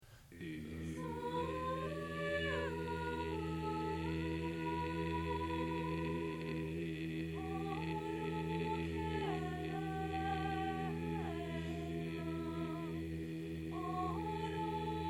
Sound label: Ederlezi Bass; training recordings